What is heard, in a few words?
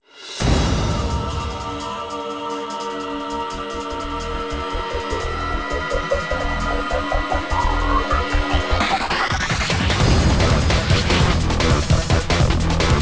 Bgm Battle